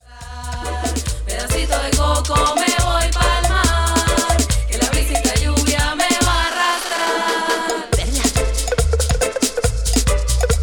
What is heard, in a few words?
Dub